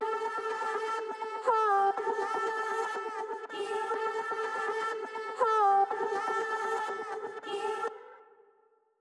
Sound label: Vocal Chops Wet B min 122bpm